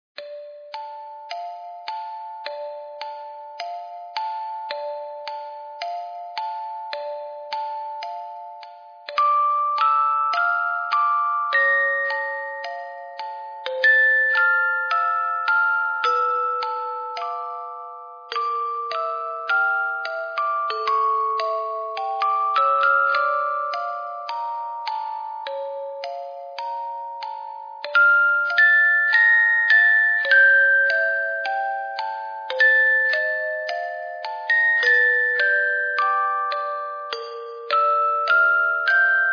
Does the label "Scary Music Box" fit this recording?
Yes